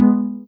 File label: soft hitwhistle; osu